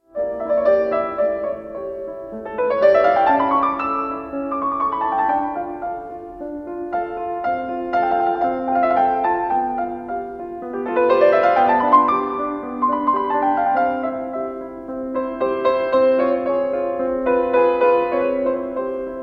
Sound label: piano sonata in f major; maria hester park; op 41